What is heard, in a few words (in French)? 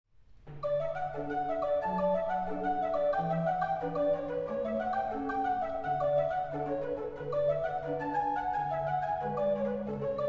clav, orgue